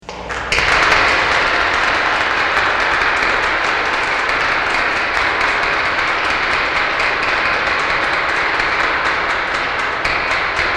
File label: Applause